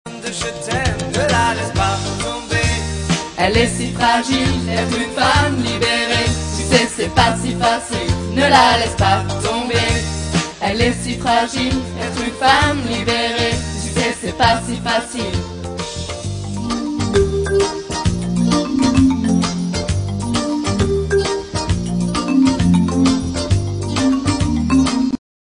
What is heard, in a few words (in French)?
Chant